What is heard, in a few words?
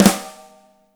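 DRY snare, NATURAL KIT; Spectrasonics; Burning Grooves CD2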